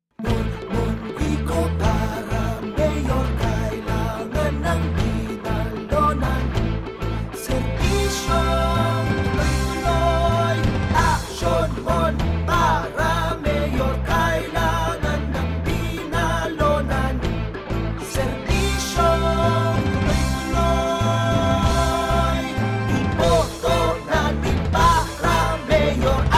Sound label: jingle; monmon guico